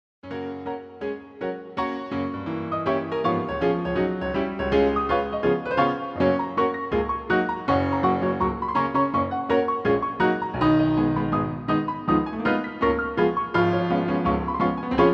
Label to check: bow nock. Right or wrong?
Wrong